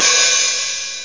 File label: cymbal; hihat